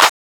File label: Claps